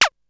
Zap, Drums; ZERO G - Datafile DISC 3

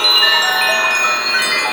A4 EVOLVE, SPIRIT BELLS; Northstar; The Wizard VOL-1

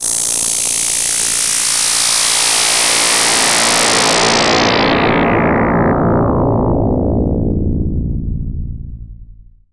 DISRUPT, ATMOS; AKAI Anime Sound FX

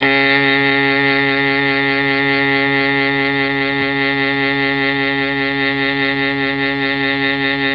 C#2 MKII SAX, Mellotron; Mike Pinder - Mellotron Archive CD

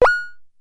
DF-500 Misc, Drumfire

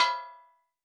Steel Drums Layer B5, Calypso Steel Drum; Percussion

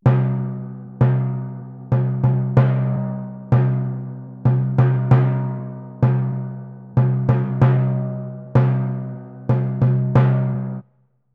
95 c min timpani, Orchestral arrangements

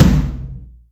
Rock, Acoustic Tom; Yamaha DTXpress

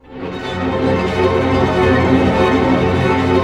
ARP C, STR ARP DIM; Sound On Sound - Peter Siedleczek's Orchestra

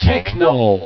techno; Amiga Soundtracker Sample Packs

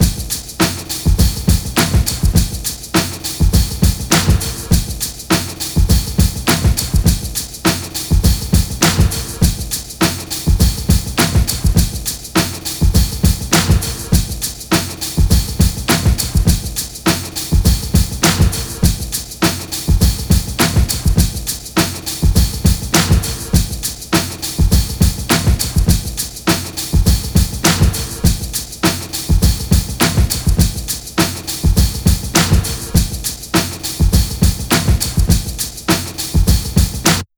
102 Tapey Perk, breaks; Lockdown Breaks

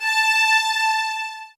A, soft violins; Q Up Arts; Denny Jaeger Master Violin Library

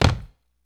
STAM, Human Perc, Body Perc

